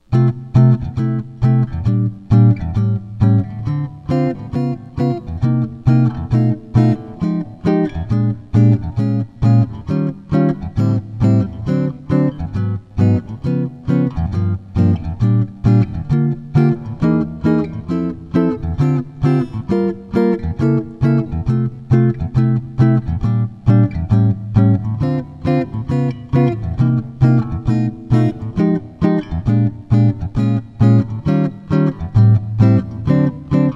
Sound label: Chorus, jazz tunes